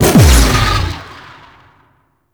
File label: Bite Cast Burst; Warframe; Voruna